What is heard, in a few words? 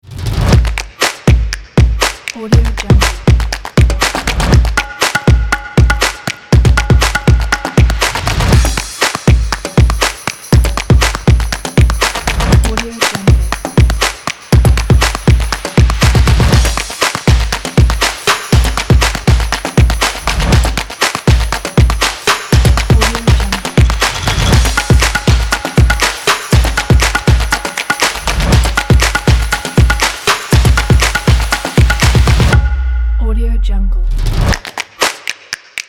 percussion kit